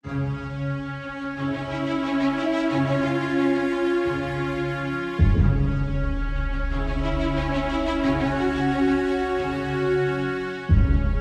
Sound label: tension dark; rough mix; music